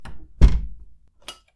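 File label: close door light, ドア閉め壁スイッチ/内; cls door light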